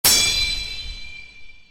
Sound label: sword clash